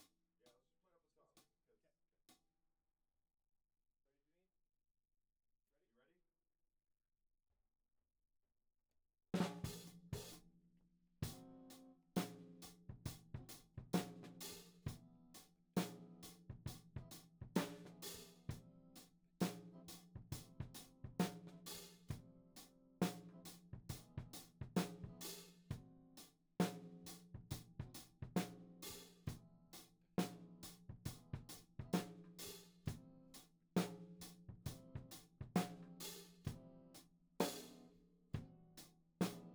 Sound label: Tom